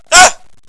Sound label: aah